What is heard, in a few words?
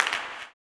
CLAP, blues & soul; ILIO; Vocal Planet